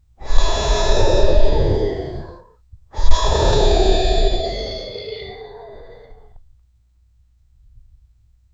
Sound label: the sound of a dragon, anger